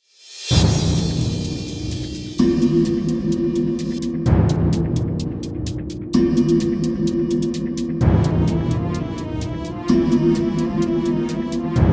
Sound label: Groovy Action Background Music